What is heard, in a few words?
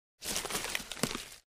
Foliage Impact Hard; hits & impacts